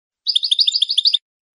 bibleos simples, campero

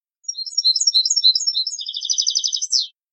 mecida, campero

